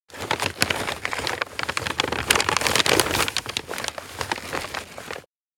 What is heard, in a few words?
Large paper bag handling